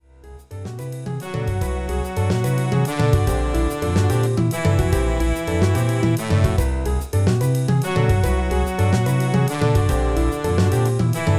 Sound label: big band